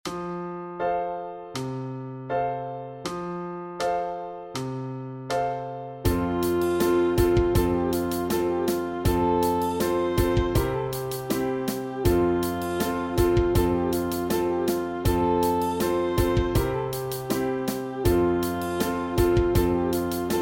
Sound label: Sax Study